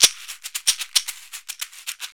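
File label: caxixi, African shaker FX; Roland LCDP14 Africa VOL-2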